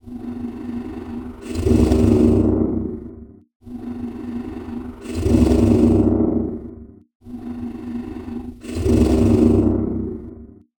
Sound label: moan